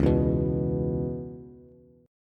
Db Minor 7th Db down Bass, Dbm7 chord; strummed; JGuitar

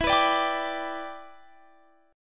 G Major E; strummed

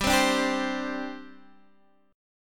G Suspended 4th

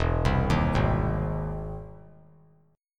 E Augmented Major 7th E down; E+M7 arpeggiated; JGuitar

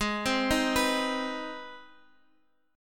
C Minor Major 7th Sharp 5th Ab, chord

